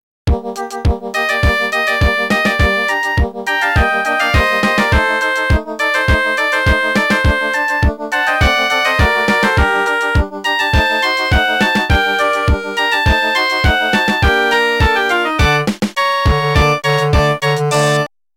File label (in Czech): melodie